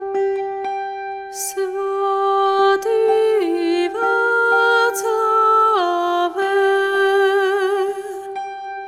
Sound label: Chorale; Wenceslas Chorale